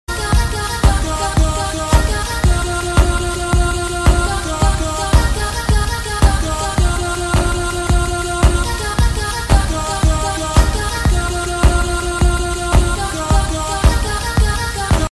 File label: Tight Bass